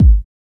Kick Shot

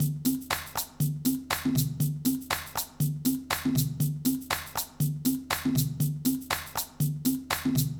Beat, 120bpm; Zenhiser

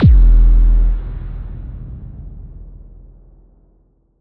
Bass Noise

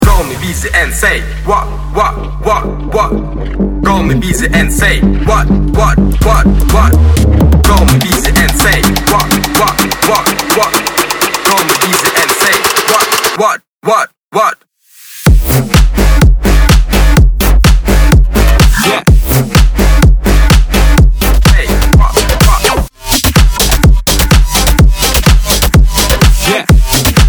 Bass House Rhythms

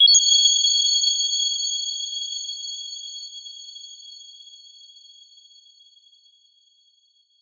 fx impact ui notice G

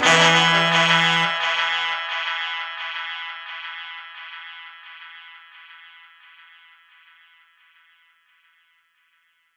Horn E 175

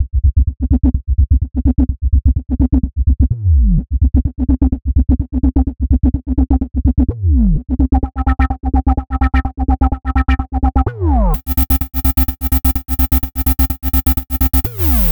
127 Cm Bass

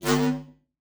G Bass

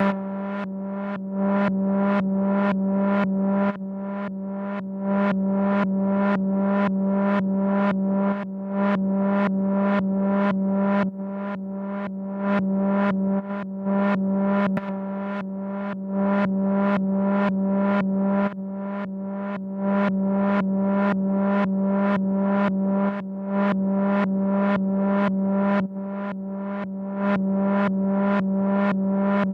130 G min Repeater

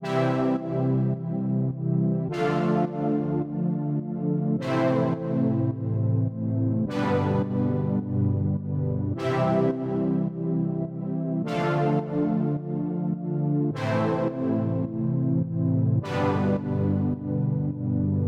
Chords, Cmaj, 105 BPM

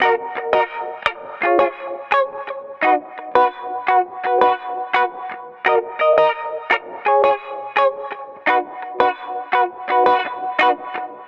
guitar rhythm B maj; zircon

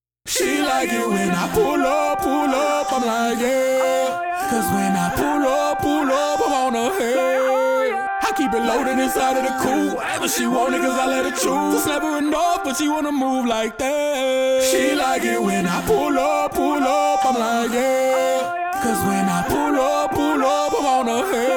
Vocals 134 Gm Dry

